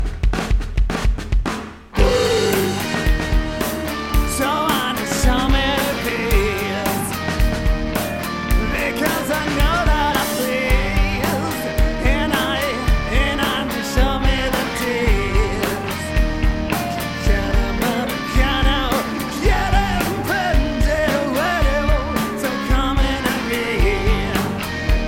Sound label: Grunge; Music Delta